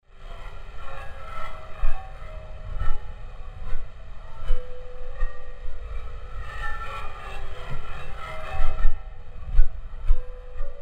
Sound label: Barn Soundscape Unusual Piano